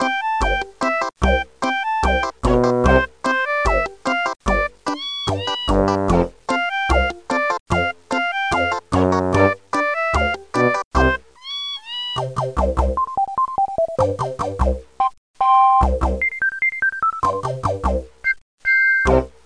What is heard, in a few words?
Loop Song; ROOKIE MOUSE; こわくないMovie